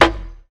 tight snare sound g key, Royality free snare tuned to the G note. Loudest frequency: 1290Hz; snare drum samples